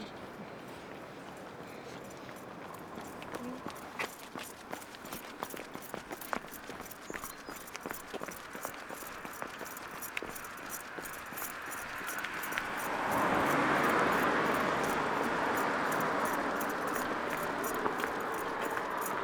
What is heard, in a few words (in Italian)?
suoni lungadige; borgo sacco